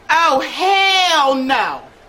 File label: ringtone